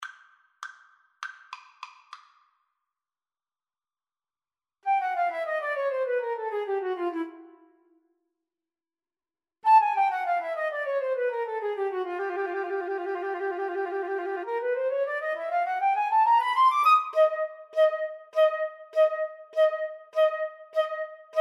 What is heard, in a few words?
Flute Clarinet Duet; Fucik Entry of the Gladiators